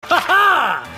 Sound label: ha ha laugh